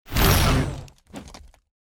swing, sword; bane

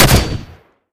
rifle fire, gunfire; weapons; br85

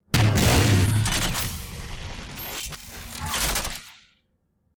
shot

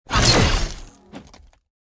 impact, sword; bane

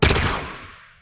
shot

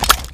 pistol clip out, weapons; m6h2

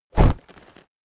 melee, plasmarifle; halo2

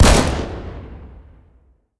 rifle fire, gunfire

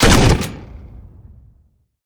fire, weapons

